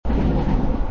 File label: train, natural